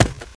concrete, footsteps infected walk; player

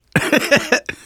laughter, survivor voice

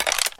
smg clip in; weapons

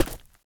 concrete, footsteps run; survivor

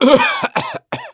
cough, survivor voice; gambler

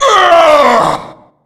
lunge attack; hunter; pain

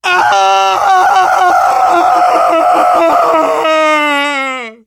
fall, survivor voice biker

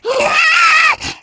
spitter lurk

